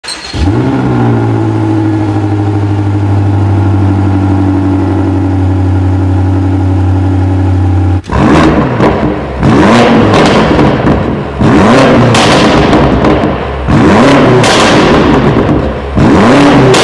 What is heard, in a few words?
bmw m5; f90